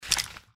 mantis arm attack; npc

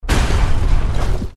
power armor fall; clothing system

